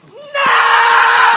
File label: aaaaaaaaaaaaaaaaaaaaaahhhahah